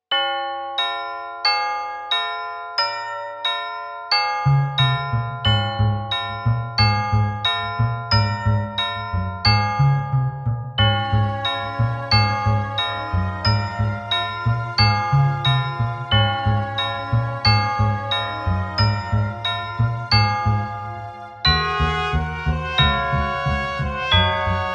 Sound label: Hopeful Holiday Bells